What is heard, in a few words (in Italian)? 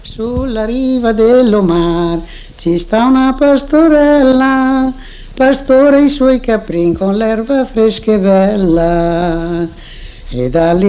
sulla riva dello mar (narrativo)